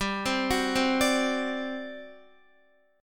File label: G Major 7th Suspended 4th, G, down, Guitar; GM7sus4 arpeggiated; JGuitar